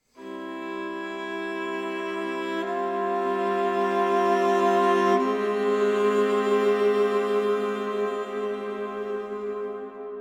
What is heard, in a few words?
Exploration Mesa Strings closer